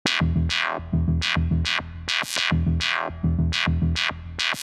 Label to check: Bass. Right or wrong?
Right